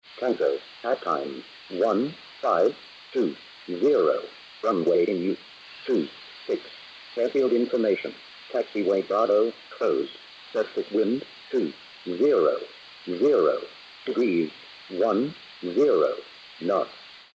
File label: AirBand Noise